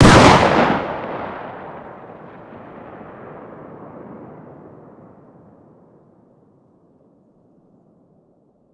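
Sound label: fire distant 12ga exterior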